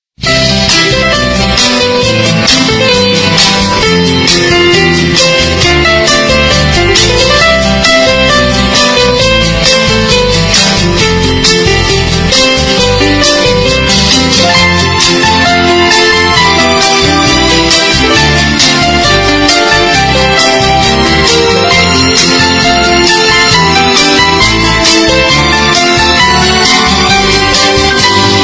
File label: Ringtone